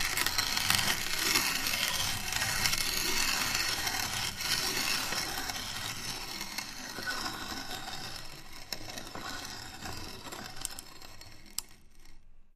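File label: Metal Grind Slow Turn, grinders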